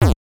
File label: Dead Duck Lands; Duck Hunt